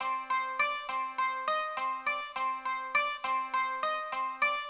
Baglamas, 102 Cmin; Hip Hop

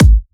EDM Future Kick; Big EDM; Future House Tools; Kicks

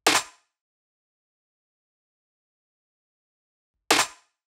Big EDM Drum Loop Snare loop, 125 BPM; Future House; Future House Tools

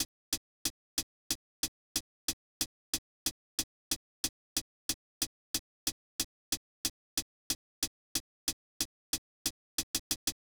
Hat, 092, Cmin, Drum Tracks

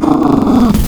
Cat, Wavetables; Cymatics; Serum; Animals for Serum - Gold Edition

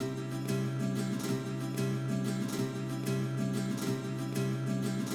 Guitar, Hip Hop Exotica, 093 Amin; Big Fish Audio

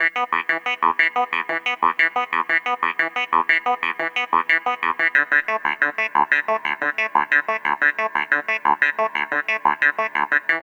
Synth; Hip Hop Philosophy; big fish audio; Apple Loops; 090 Emin

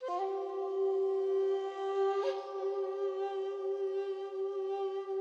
Flute, 092 Cmin; Hip Hop Exotica; Apple Loops; Big Fish Audio

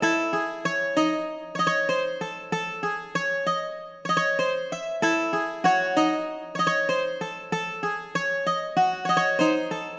Bouzouki, Hip Hop Exotica, 096 Amin

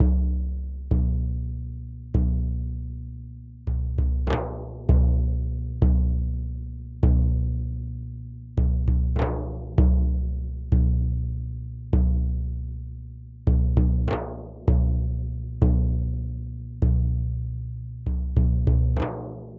duff 098, Hip Hop India, 098bpm

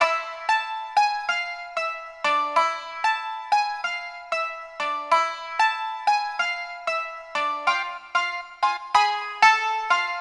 Baglamas, Hip Hop Exotica, Apple Loops; Big Fish Audio

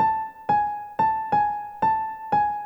Piano, Hip Hop, 090, E min

